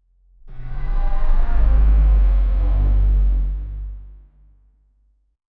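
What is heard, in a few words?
stylized floating character; dash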